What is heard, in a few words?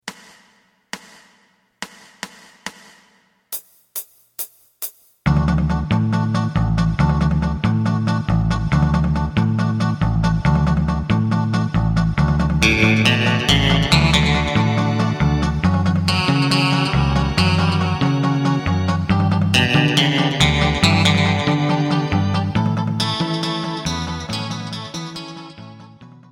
drum